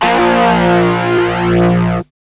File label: GUI Dis A2#, GUIT; Best Service Gigapack 2